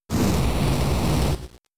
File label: Fire Spin turn damage